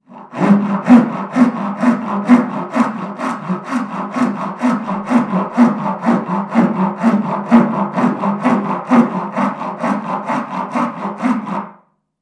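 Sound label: file sound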